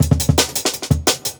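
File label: Jungle Breaks, 170 bpm; Burst; Original Jungle Breaks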